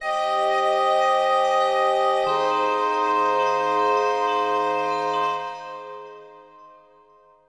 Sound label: synth pad ambient 160 C; Sphere